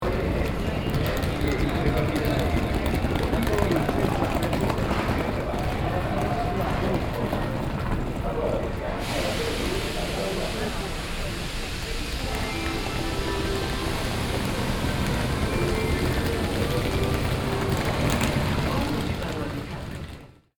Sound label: Trolley